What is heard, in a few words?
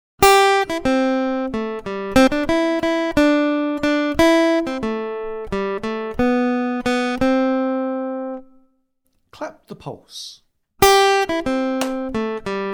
Aural Rhythm Test, acoustic guitar; Grade 7